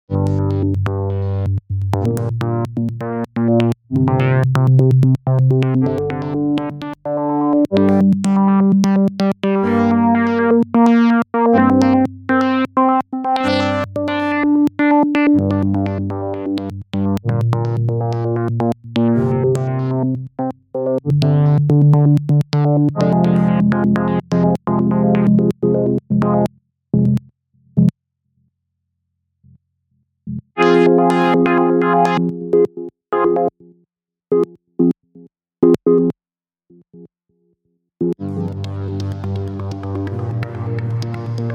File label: Click Pad; Galaxy DENEB